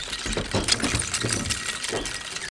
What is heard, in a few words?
Lift Chain Loop; doodle jump 2; android